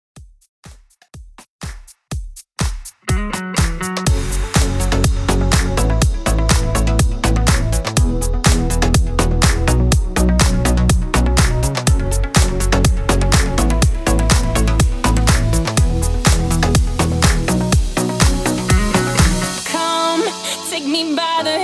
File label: 123 BPM